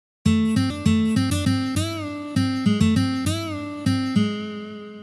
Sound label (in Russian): Blyuzovye liki i uprazhneniya